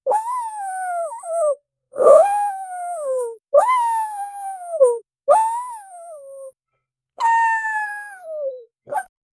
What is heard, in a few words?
small cute creature whoop